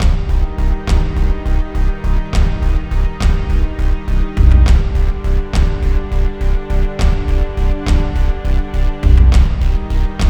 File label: Suspense Combat; Cancelled podcast project